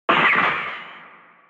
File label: gunshot